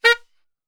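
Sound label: A# saxophone shot, Brass Super Section; Best Service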